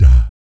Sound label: PERC, Vocal, Jazz FX, BASS PERC